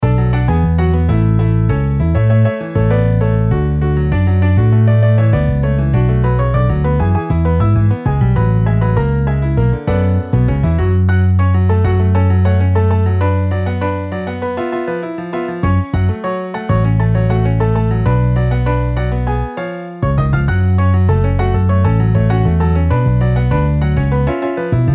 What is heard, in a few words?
Piano Bass MIDI